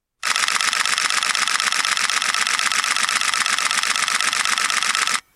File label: nikon d2h shutter